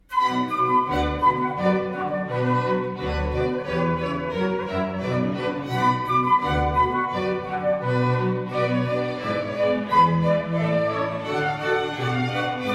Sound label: badinerie flute